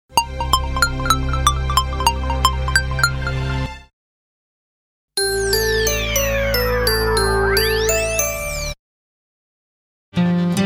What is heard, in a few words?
jingle; cunda